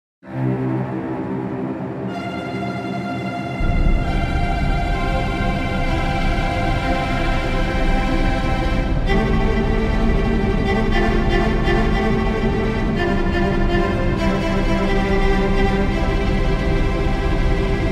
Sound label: orch